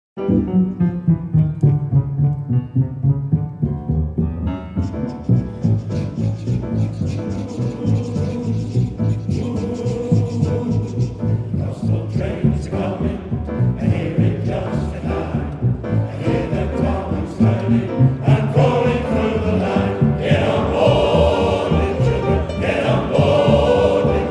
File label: Gospel Train